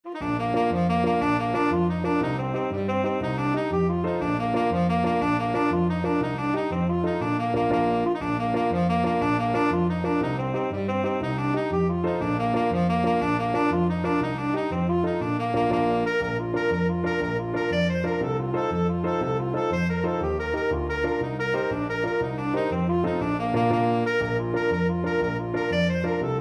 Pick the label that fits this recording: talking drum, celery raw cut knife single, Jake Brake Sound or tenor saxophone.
tenor saxophone